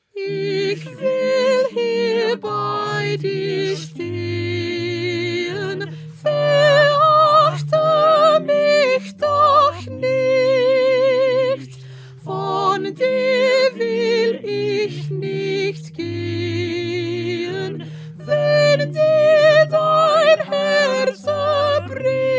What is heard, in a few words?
Soprano